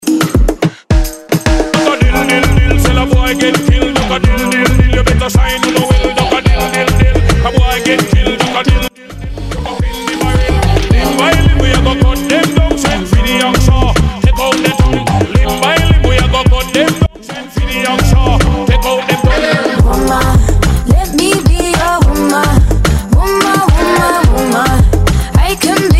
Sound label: hype redrum 108 BPM; dj kiwi